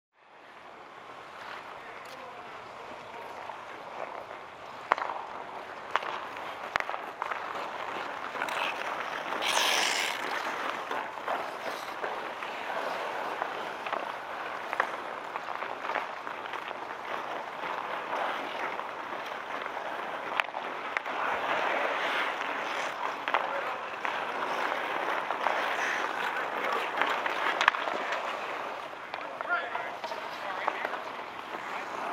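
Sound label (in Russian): игра в хоккей на открытом воздухе звуки среди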